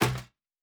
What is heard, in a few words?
Metal Box Impact